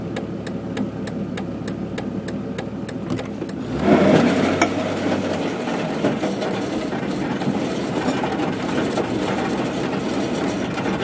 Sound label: jeep eating metal